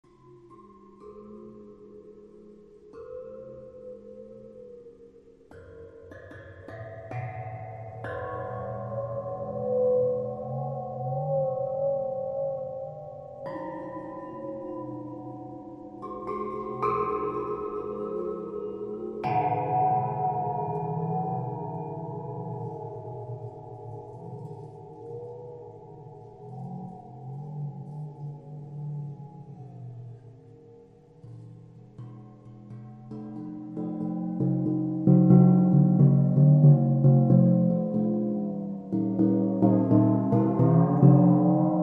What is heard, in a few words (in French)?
orka mailloche